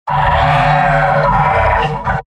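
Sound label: pyro m pain critical death; tf2; garrysmod; mvm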